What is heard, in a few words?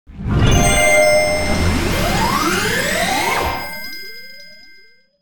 player progression xp fill lvl up